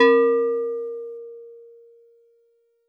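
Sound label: Steel Drums DX100 A#0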